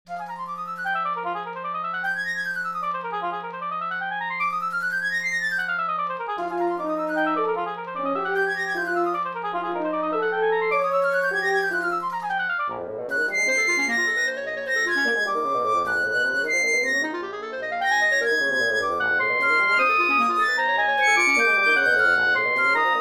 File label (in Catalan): Quintet de vent, quinteto de viento; el vaixell viking